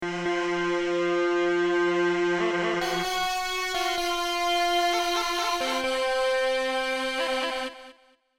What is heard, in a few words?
Horn